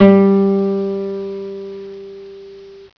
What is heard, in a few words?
tune 3 g, guitar tuner